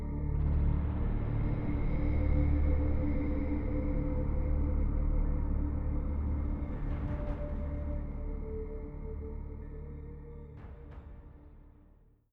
stinger combat; Eon Altar; Stinger BloodguardCombat

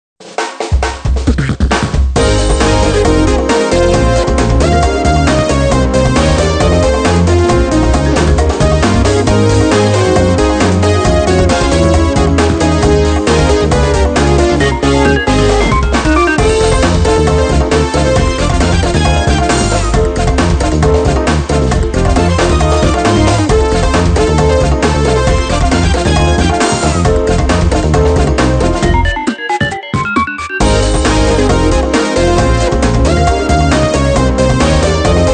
Music Success event

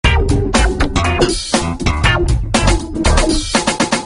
Music Lose; Speed Rider; Android

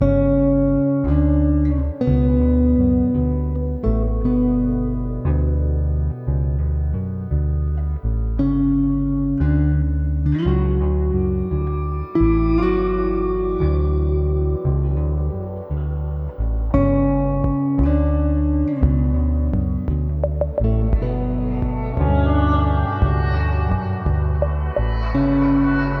lydian waltz - 16 bit; Speed Dating for Ghosts

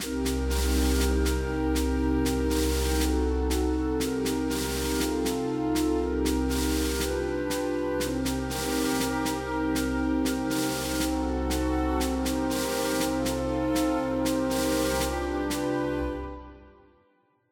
Military Exploration Snare

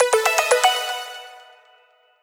menu melody confirm

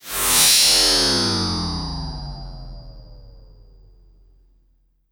game music fast to normal

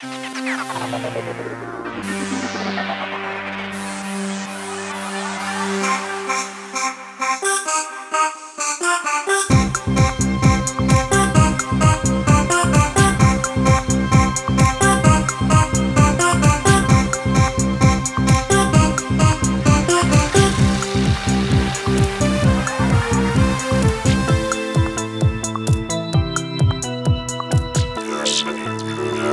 laserdance; Avoid Sensory Overload

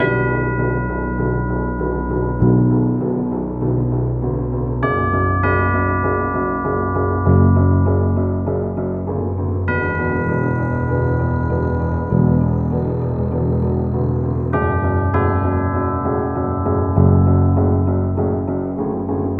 Tension Loop 99bpm 8bar; project zomboid

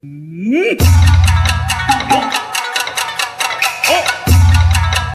Jingle Mail; Taiko no Tatsujin DS Touch de Dokodon

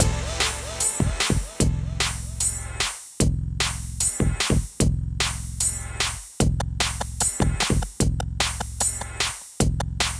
comic music loop; Super Dodgeball Beats

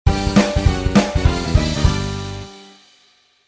Level Failed

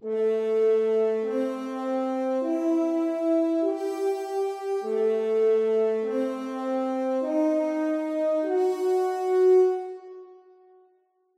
Horn; Chasing Fakir

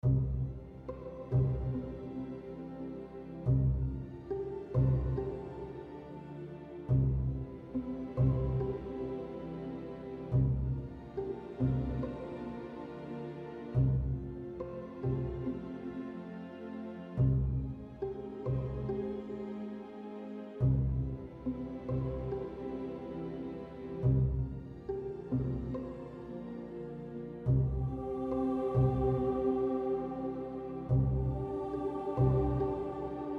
Music Gameplay; Doodle God Puzzle Collection

